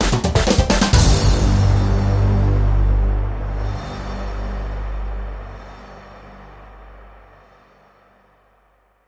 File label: Hit & Hold It Sting